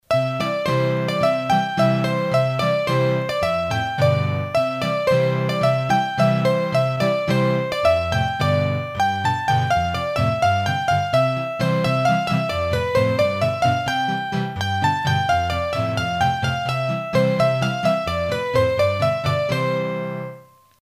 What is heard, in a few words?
Scottische Valse, hurdy gurdy; Massif Central